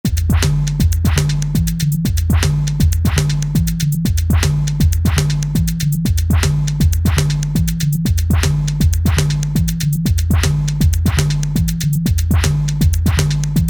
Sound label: Techno